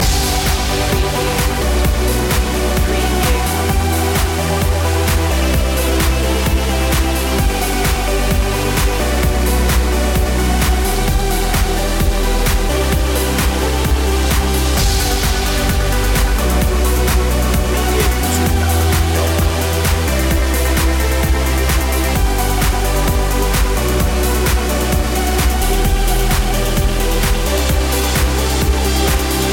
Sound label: forward motion loop